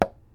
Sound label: Plastic Lid Tap